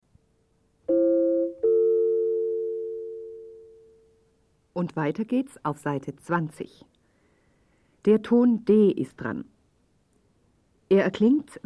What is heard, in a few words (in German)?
Spiel Blockfloete